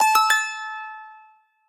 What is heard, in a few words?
New High Score; doodle jump 2; android